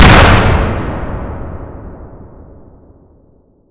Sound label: tank